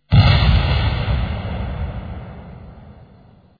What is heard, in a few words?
fire